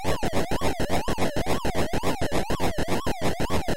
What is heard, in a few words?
Smashing eggs